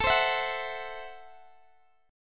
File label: A Minor 6th A Guitar DADGAD